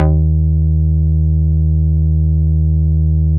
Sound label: TUBE D#2, BASSES